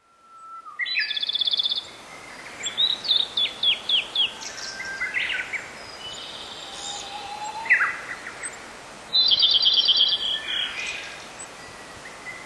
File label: birds